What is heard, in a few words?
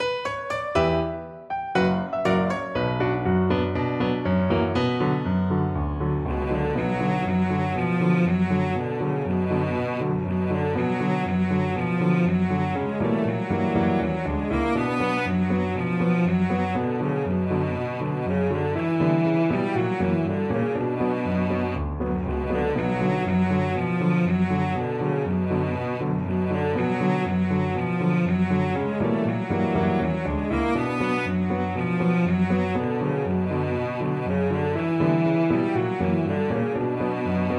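violoncello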